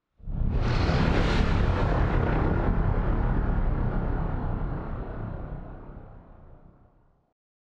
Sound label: ship colossus move; Stellaris; machineage